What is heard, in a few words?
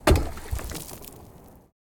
ice hit frozen lake surface impact break through to water wide; mkh8060; Sonniss GDC